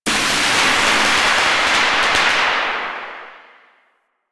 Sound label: Corpus Powerplant Lightning Storm; Ambience; Warframe; Corpus Railjack; Tether Power Plant